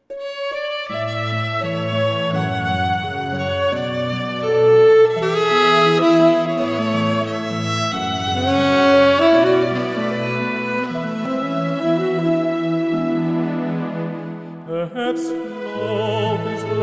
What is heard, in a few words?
sax